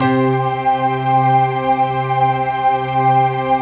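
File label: chord pad, Pads; Best Service; XX Large - Pads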